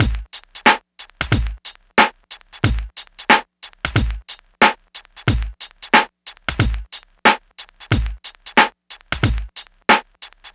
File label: Hip Hop Beat, Drums